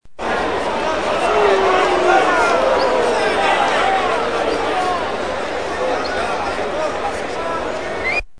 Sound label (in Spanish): estadio beisbol